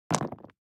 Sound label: DICE on Neoprene, Throw and Roll, Standard, 6 Six Dice; Samples; ArticulatedSounds; DICE Sfx Party (Extended)